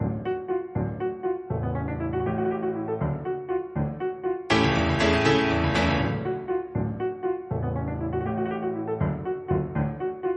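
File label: allegretto scherzando, ringtone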